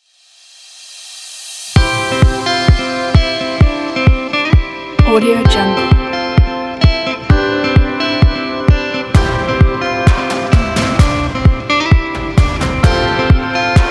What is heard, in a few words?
upbeat corporate motivational pop